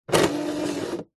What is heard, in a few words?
household dvd player disc tray open